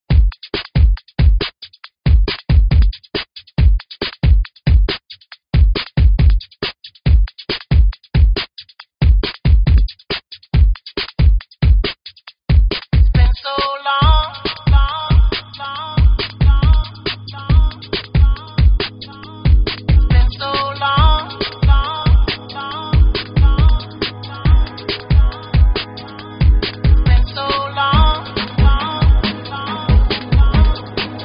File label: funk; multitrax